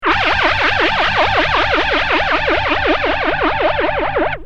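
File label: guitar Fender flanger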